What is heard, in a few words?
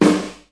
snare sound g key